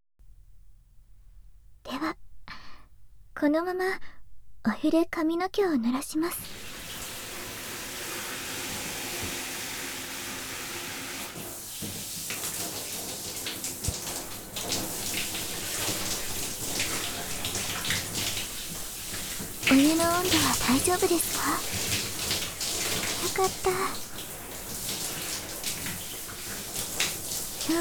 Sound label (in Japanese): シャンプー